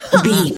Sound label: voice female hurt pain low